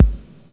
808Vrb K, KICKS; Roland MV8800; DAANZ KICKS

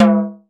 FRAME DR, ETHNIC PERCUSSION; AKAI S6000 CD-ROM - Volume 5

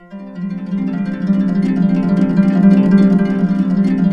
D, Harps; Complete Harps; USB Soundscan vol.62; AKAI

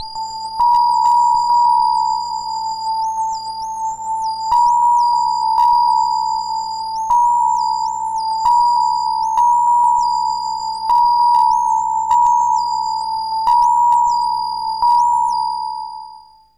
AMB BELL; Trance; SYNTH SEQ; AMG - Global Trance Mission VOL-2

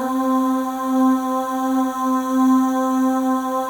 M+F AHS; Spectrasonics; Symphony of Voices

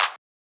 wide clap

